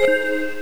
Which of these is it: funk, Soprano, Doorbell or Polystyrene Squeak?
Doorbell